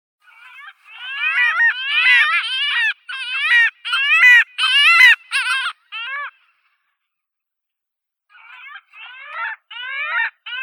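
arctic skua Stercorarius parasiticus, nature sounds; pomornik korotkohvostii; zvuki prirodi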